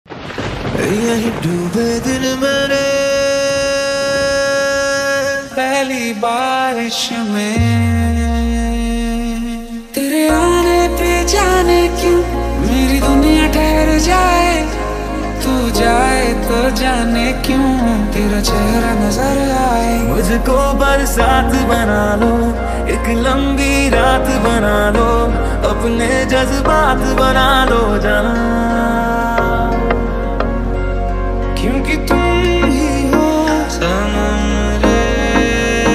Rainy Rhythm Monsoon Special Mashup

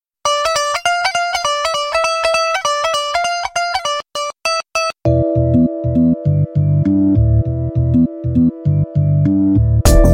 Theme; Jugadu

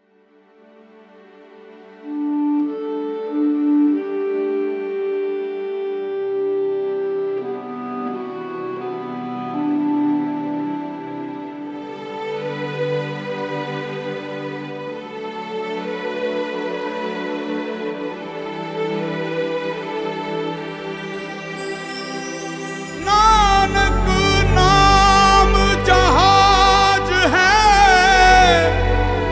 Gurbani

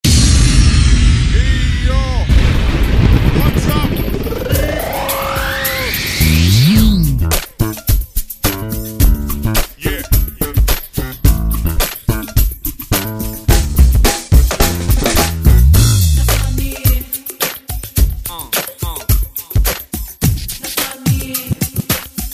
Competition (Instrumental); Aftab & Suhani

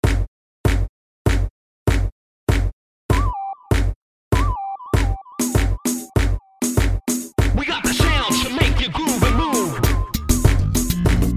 Instrumental

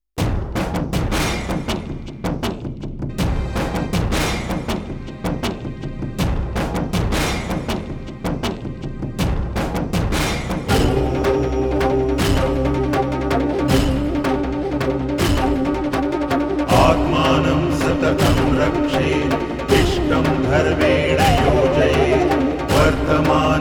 Shloka; Pitaah

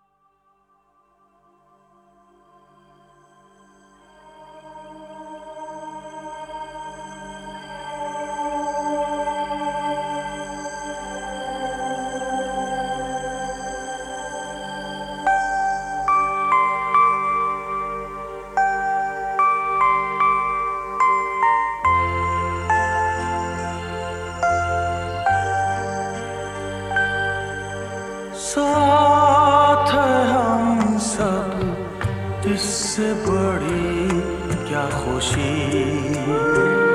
Sad Version

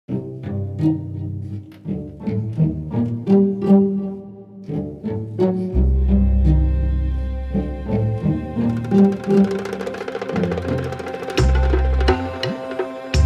Stock Market Blues

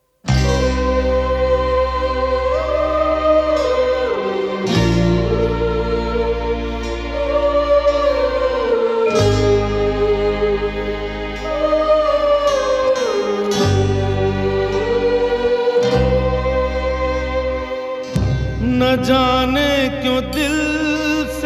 (Male Version Sad)

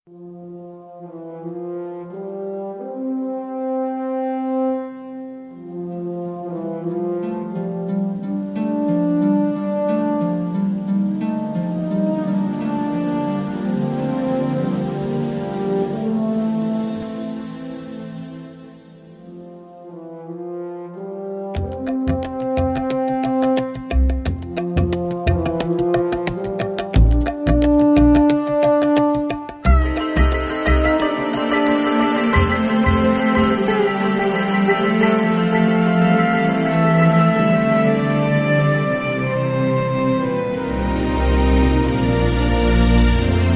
Instrumental

